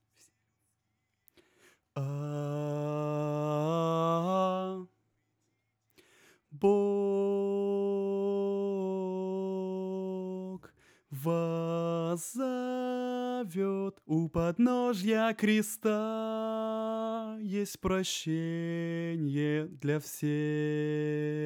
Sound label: alto